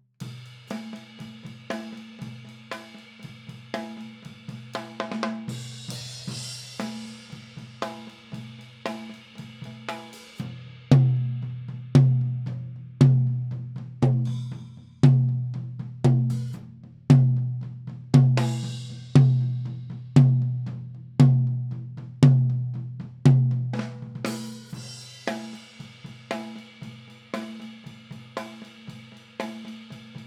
Toms

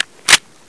usp clip in; cstrike; weapons

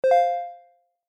chime

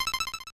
jingle

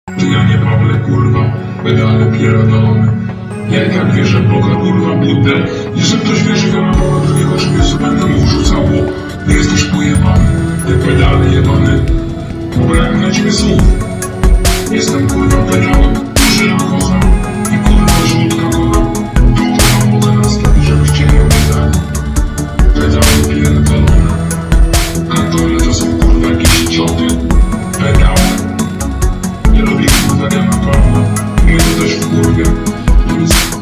ty janie ambient; pawlacz

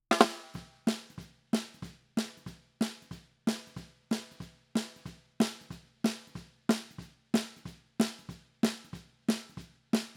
Snare Bottom Drums

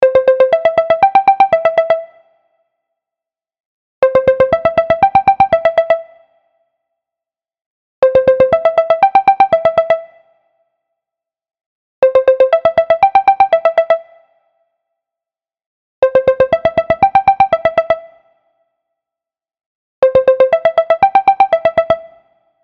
phone ringtone penthouse

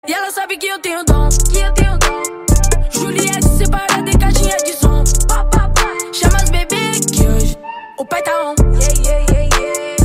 music; tempovip

